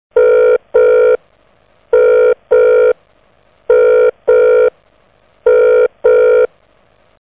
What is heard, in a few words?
simple ringtone